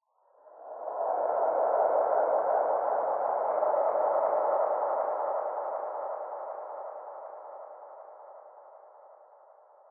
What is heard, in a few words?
Library Echo Pad